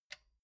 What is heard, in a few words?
metal button stereo spring release